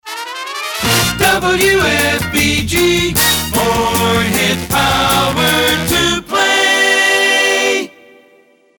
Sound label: Gliss; WFBG; PAMS; Gliss Sig